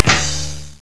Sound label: folding chair; This clang is the sound of a folding chair being smacked with a lead pipe